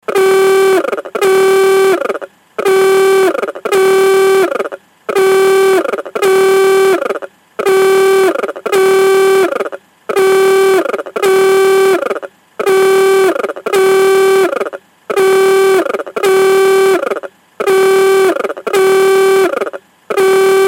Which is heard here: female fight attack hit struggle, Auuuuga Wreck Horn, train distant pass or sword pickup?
Auuuuga Wreck Horn